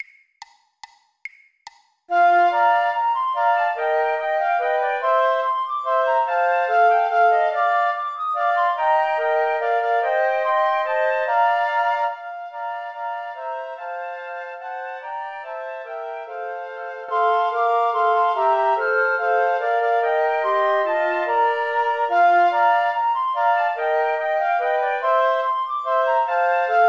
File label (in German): Flöte Klavier; We Wish You a Merry Christmas; 144 bpm, 2x; weihnachten